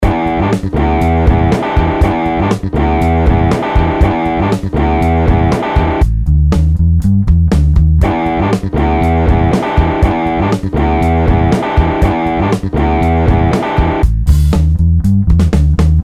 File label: Volcano